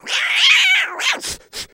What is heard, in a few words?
Cat Angry Squeal